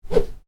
Swish small, Swish: Short, Light; Whooshes; Sound Design; Whoosh Sound Effects; Whooshes (Sound Effects:Sound Design); Stuart Duffield

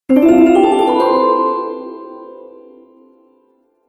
Harp And Chimes Gliss Ascending; Musical

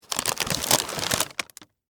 Package Remove, From, Box, Plastic; Foley; Plastic Sound Effects; BlastWave

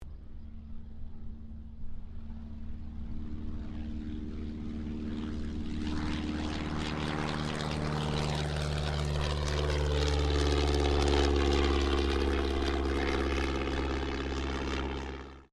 AIRCRAFT DH82A DEHAVILAND TIGERMOTH TAKEOFF; Airplanes

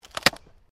WOOD PLANK BREAK; Material components for constructing more complex sounds; Sound Effects:Materials

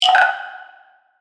Hit Percussive, Reverb; Production Element; BlastWave

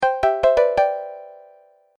Message Alert Sound, musical; Twistedtracks

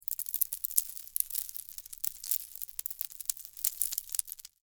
Impact Debris Small; Materials; SFX Bible

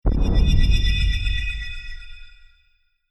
Fantasy Magical Hit; Magic Sound Effects

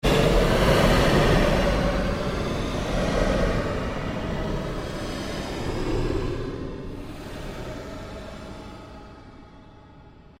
Created Pulse Long Sweep, Sweeper Sound Effects; Sound effects created by manipulating other sounds

